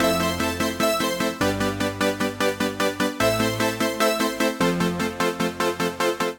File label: Switched On DCO (Am) 150bpm, Music Loops; Ableton; Factory Packs; Singularities